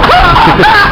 medic death

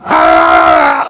heavy pain severe

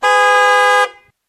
horn, kart horns

